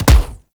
Weapon Punch Bow; Warframe; Weapons; Bows

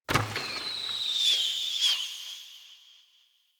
Bow Shot Arrow Rain; Weapons; Player; Josalfar Bow

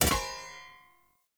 Short Sword Medium Impact Metal

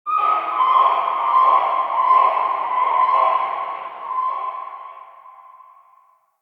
Swamp Tropical Bird; Ambience; Midrath Island

